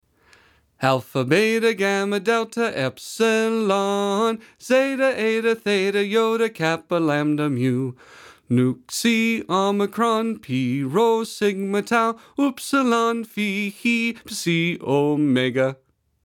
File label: Alphabet Song